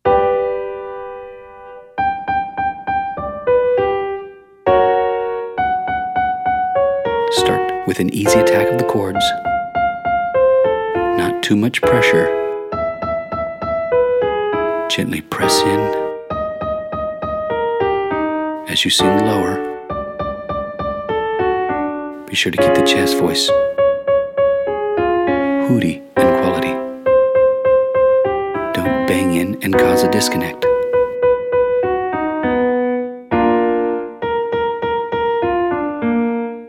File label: Exercise 4 your turn females; singing lesson